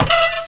Szemafor; Pure Pinball

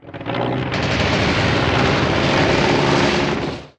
repcsi; Pure Pinball

